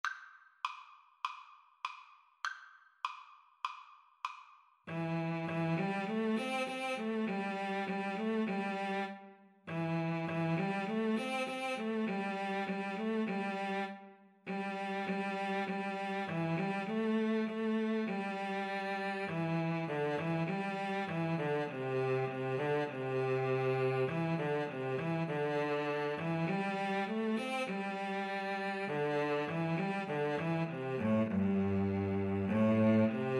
Cello Duet

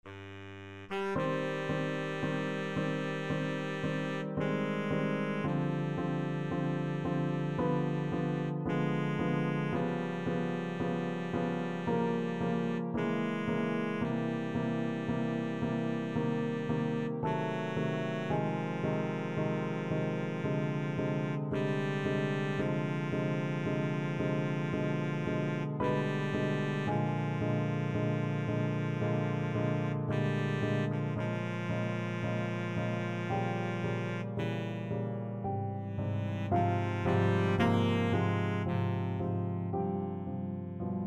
baritone saxophone

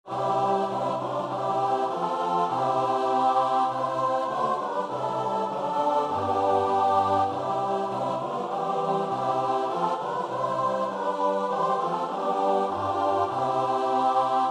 a charge to keep, choir

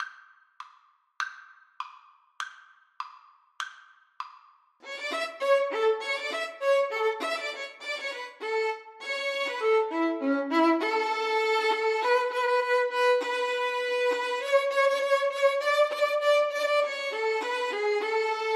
violin duet

